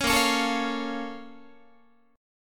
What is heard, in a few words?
A sharp Minor Major 7th, Guitar; strummed; 16,18,16,19,17,16; 4A,4E,5A,5D,5G#,6C#; JGuitar